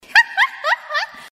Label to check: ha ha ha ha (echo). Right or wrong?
Right